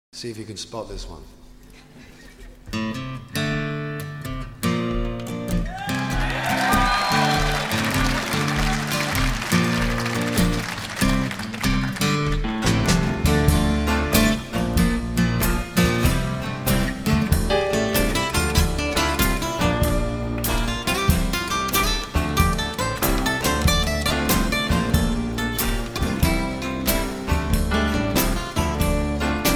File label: Acoustic, Live